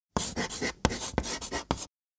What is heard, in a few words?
Chalk stick writing short